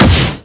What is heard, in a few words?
fatal strike; uwc3x